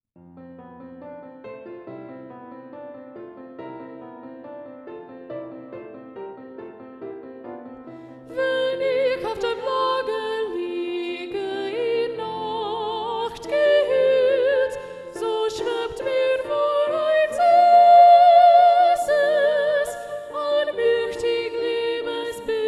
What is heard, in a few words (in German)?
SA, Soprano Predominant; Abendlied